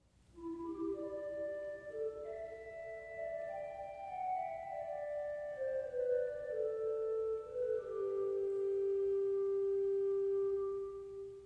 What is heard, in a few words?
Bourdon 8